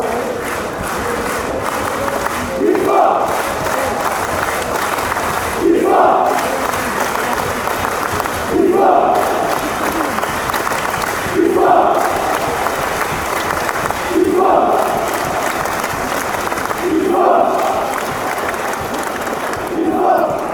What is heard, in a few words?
wisla claps